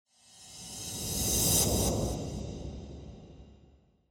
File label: Scene Flashback Mid